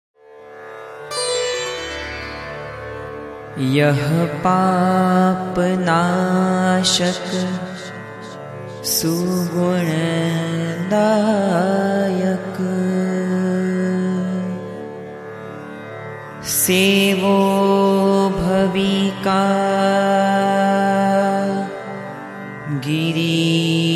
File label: chalisa hindi